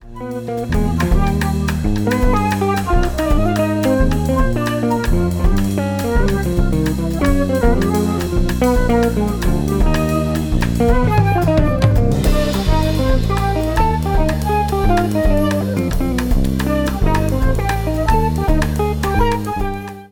Mambo

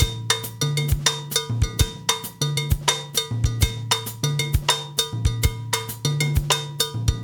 basic 6 8

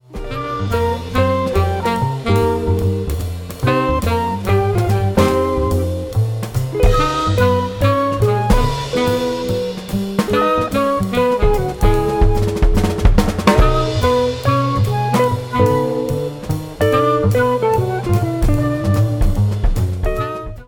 Blues

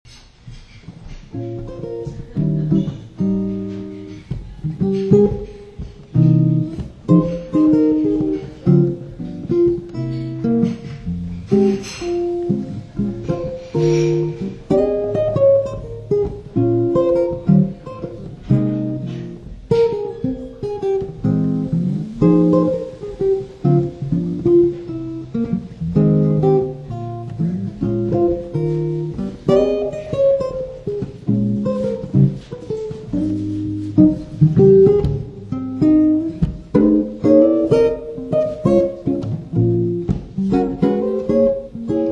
solo; Solo Jazz Guitar

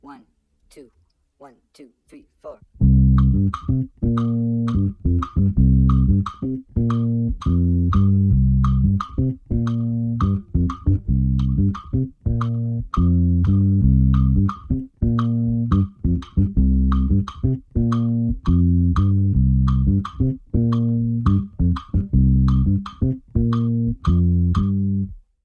bass mozambique traditional

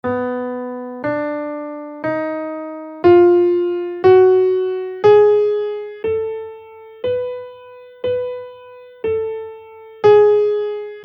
hungarian major scale in B

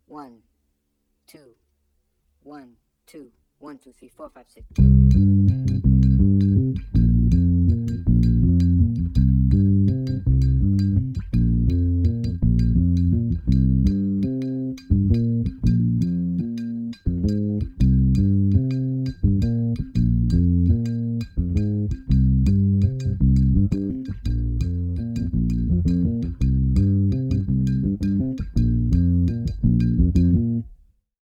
6 8 bass basic groove and variations

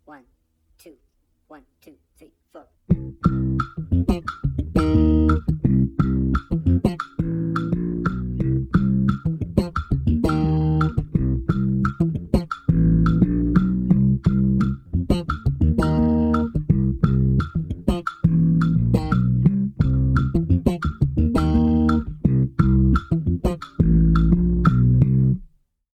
bass mozambique slap funk

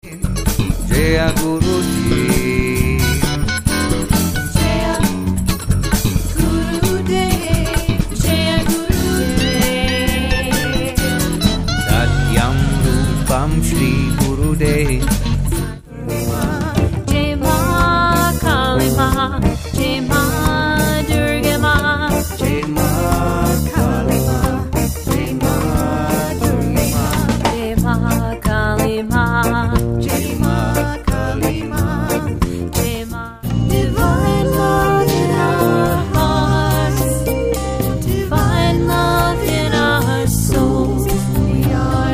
Mantra Magic CD montage

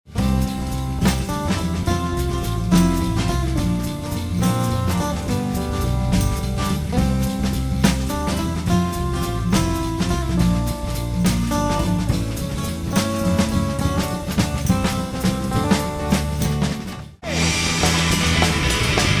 BASS; Bass Playing; montage

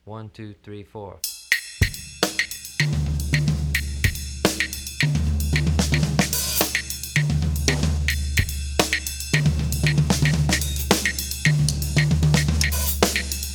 mozambique variations

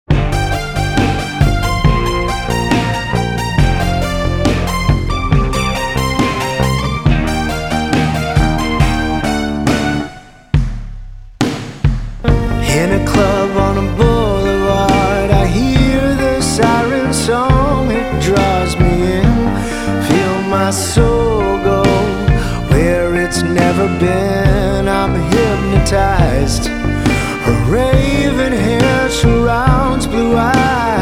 Sirens Song w vocal

